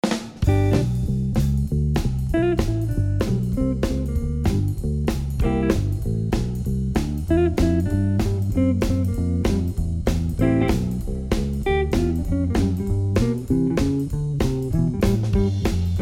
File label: Jazzy Swing Blues Drumming